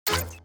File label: step; ERRORHEAD